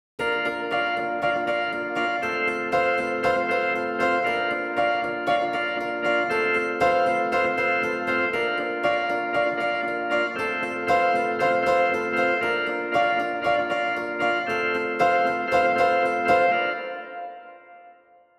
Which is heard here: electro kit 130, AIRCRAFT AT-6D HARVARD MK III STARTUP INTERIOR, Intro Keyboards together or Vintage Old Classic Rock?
Intro Keyboards together